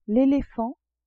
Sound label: elephant